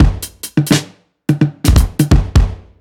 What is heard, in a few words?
Kit Heavy Swing, 85bpm; off the grid